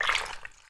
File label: Gushing Flesh